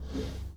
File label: Foosball Center Slide